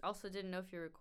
BG Vox

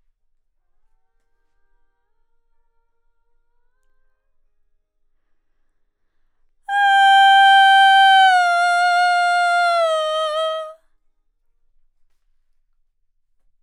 Vox start new

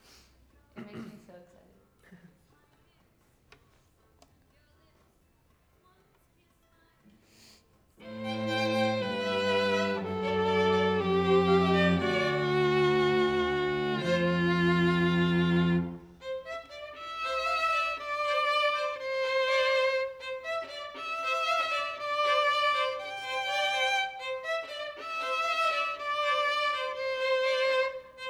violin

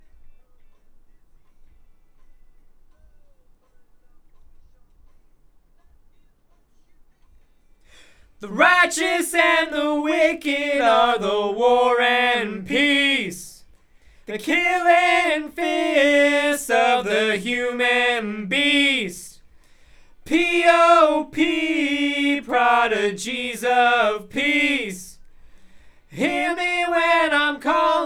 BG Vox

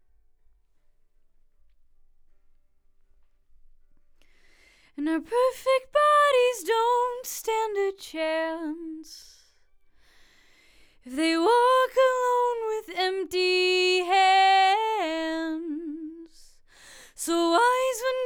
Vox

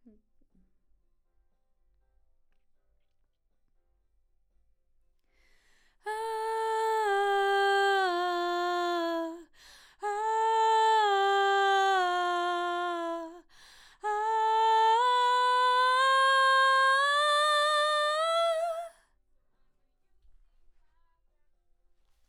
BG Vox

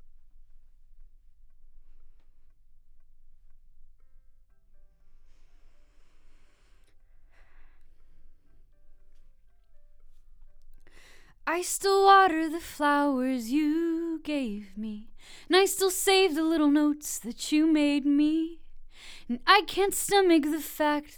OMG VOX

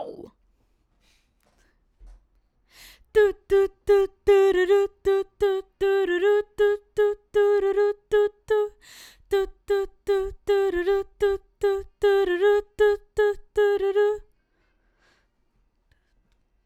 BG Vox

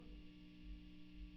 GTR

